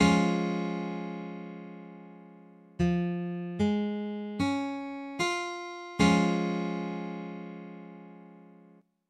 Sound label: Guitar Chords, C Major